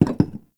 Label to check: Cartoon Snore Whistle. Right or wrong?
Wrong